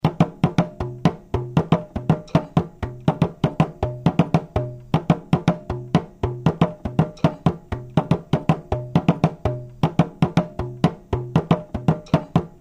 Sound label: sogo, ghana, efutu